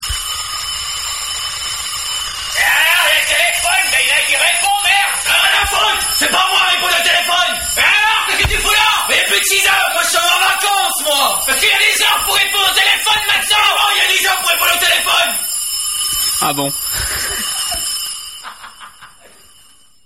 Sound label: telephone